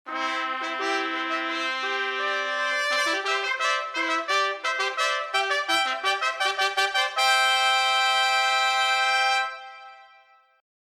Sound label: fanfare herald tpts; tippet